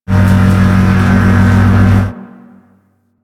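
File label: Cannon Pod Shell Door Slide Open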